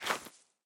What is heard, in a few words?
Grass